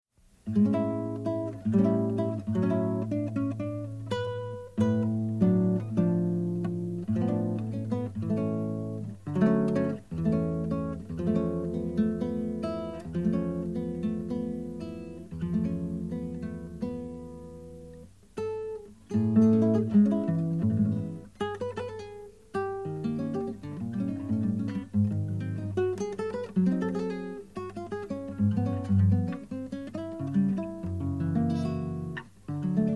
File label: viola solo guitar